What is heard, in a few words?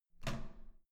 door handle down hard